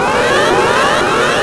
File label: alarm